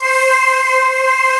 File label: VOICE, HOUSE